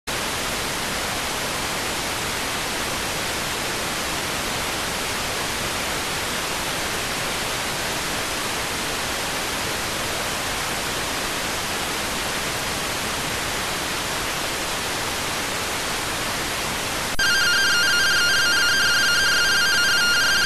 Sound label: rtty rx